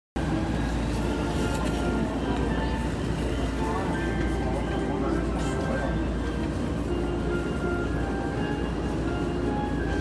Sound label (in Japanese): 東海道線 川崎 南, train sounds